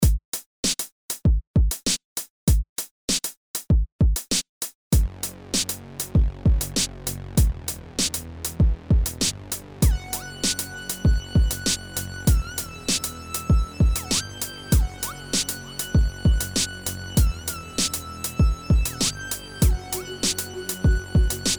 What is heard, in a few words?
beat